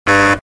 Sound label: wrong sound